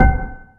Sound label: JV HAMMER BL, JV-1080; Syntec; Wall of Sounds